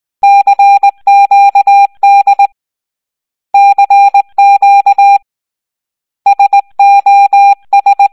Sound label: Morse code; raving rabbids travel in time wii gamerip 2010